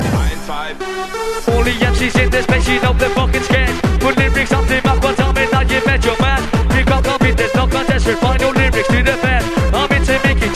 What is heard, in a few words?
Ringtone Lyric